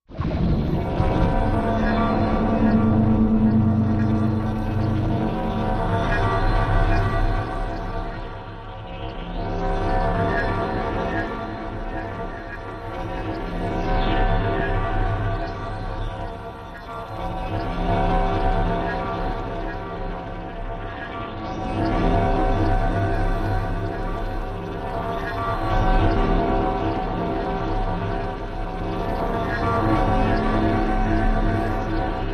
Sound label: Slw Mover Machine Haun, sci-fi ambience